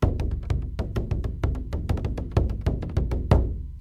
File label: Bodhrain solo track; bodhran early childhood music